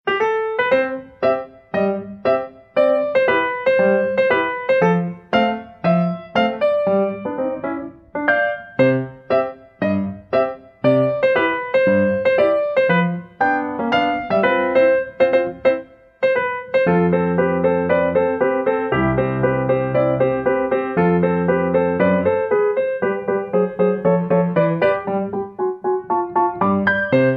kitchen piano

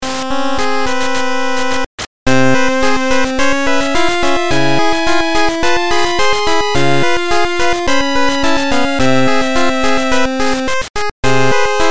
8bit; arabu sabaku

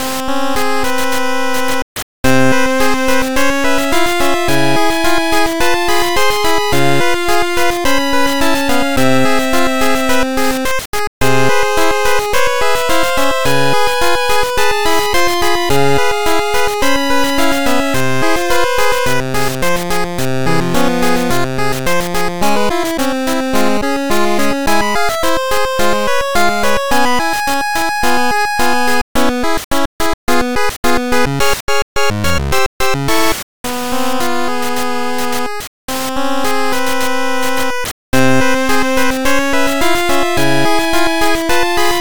8bit arabu sabaku Loop

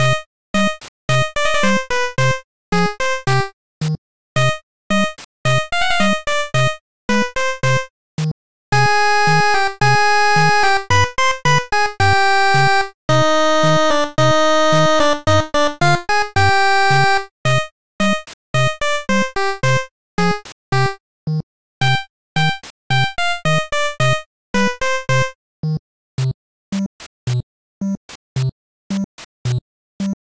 8bit Loop

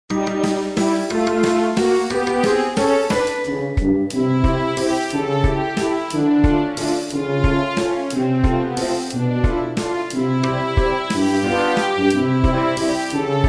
toy waltz

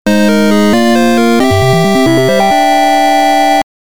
8bit juuyou item

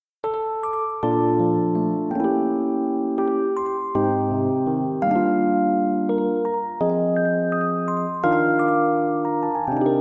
game over

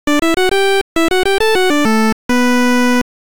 8bit

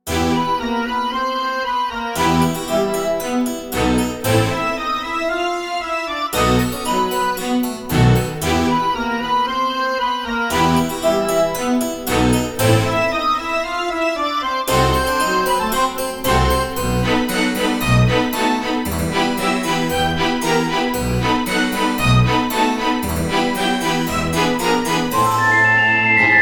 halloween house

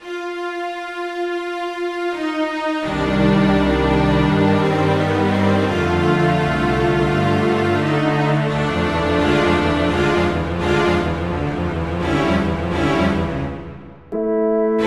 battle

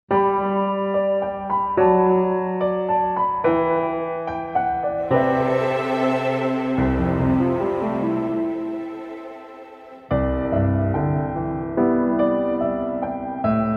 piano

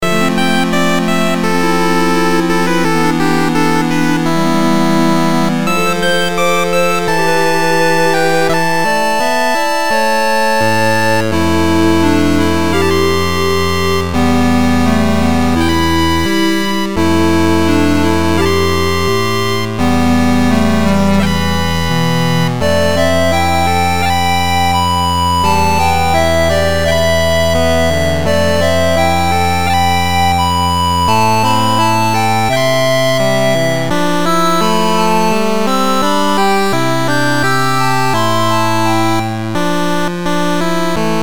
8bit mysterious loop

